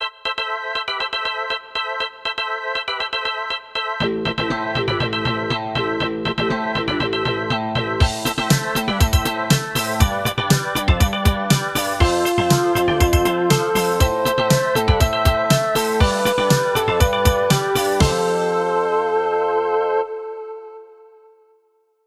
Victory

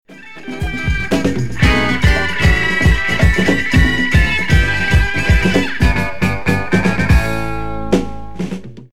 Guitar & drums

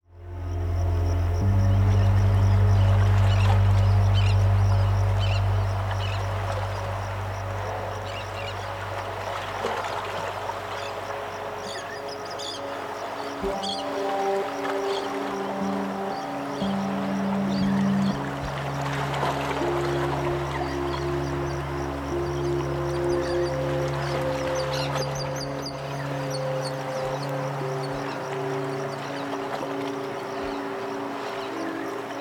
Sky sounds

